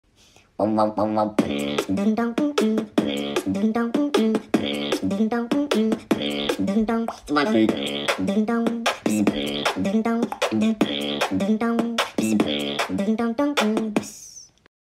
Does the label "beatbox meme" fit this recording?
Yes